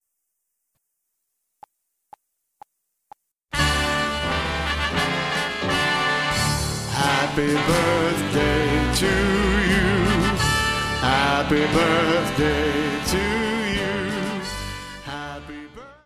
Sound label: Happy Birthday to you Voices G; Party Song